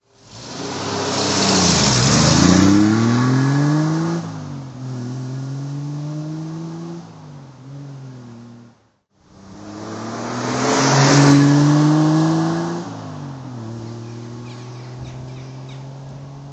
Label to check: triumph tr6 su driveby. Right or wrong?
Right